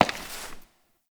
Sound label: Step Ice; Warframe; Koumei